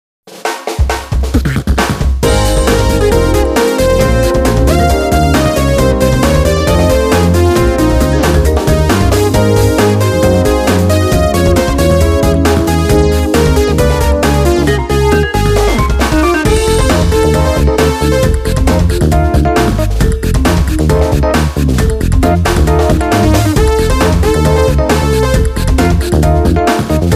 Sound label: Music Success event